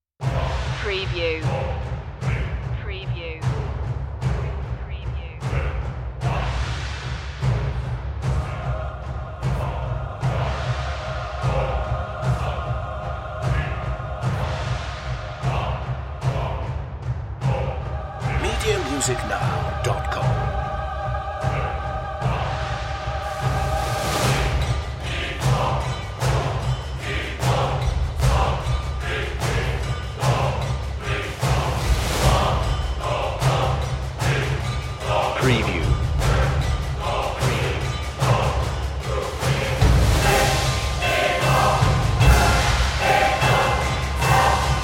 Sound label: perc and choir only